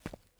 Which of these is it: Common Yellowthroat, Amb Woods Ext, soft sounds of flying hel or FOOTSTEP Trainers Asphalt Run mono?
FOOTSTEP Trainers Asphalt Run mono